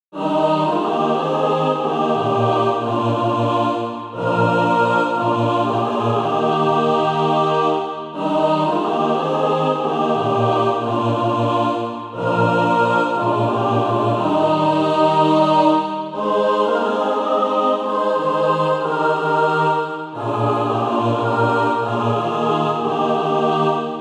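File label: mixed, Practise Music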